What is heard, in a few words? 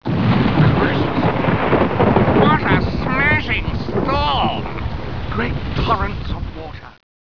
storm